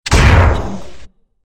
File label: shotgun; srahayu